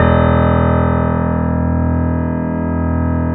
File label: E 0, Keyboards Acoustic, GRANDP ST; USB Soundscan vol.17